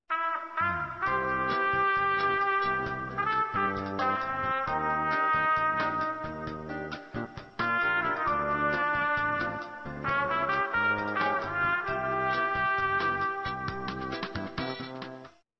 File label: Elevator Music